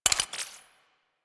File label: Blastor Pistol Mech; Warframe; Weapons; Corpus